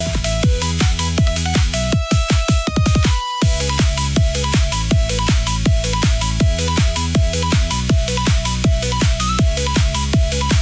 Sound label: loop